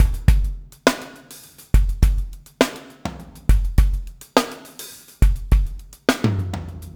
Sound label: PEAK, Backbeat